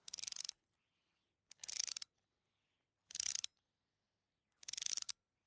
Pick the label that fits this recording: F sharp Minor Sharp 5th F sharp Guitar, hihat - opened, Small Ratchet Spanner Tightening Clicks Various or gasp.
Small Ratchet Spanner Tightening Clicks Various